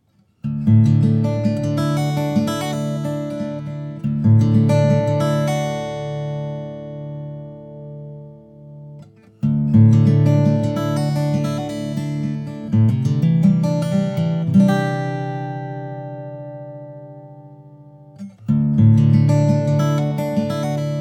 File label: Guitar